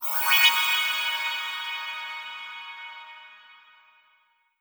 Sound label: unicorn chime; Politburo